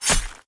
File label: melee Hit